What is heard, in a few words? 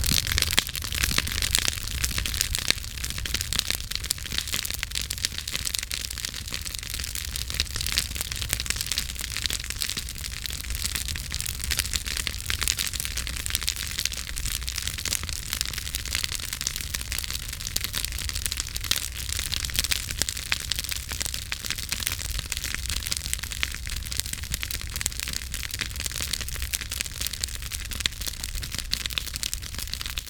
Campfire